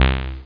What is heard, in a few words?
Beepy; Total Distortion Big Demo